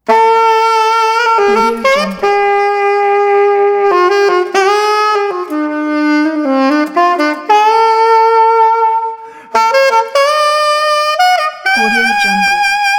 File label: saxophone solo; greenrocketaudio